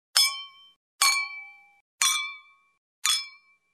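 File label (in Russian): zvon bokalov, glasses ringing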